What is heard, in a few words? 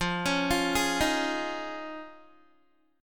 F Minor 7th Sharp 5th, Guitar; arpeggiated